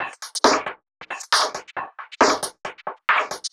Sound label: Beat Mod, 136bpm, uk garage